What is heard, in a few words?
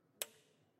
archery foley string ping